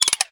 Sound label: Trap Set